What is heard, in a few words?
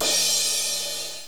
crash; hofclient